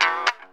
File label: GTR TON; E-Lab; X Static Goldmine 2; akai